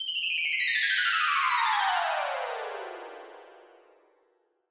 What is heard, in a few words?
trill